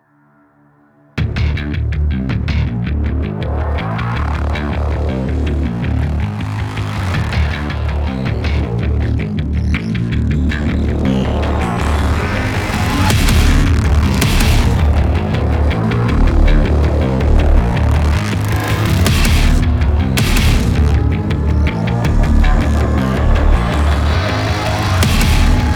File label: Trailer Music; Doom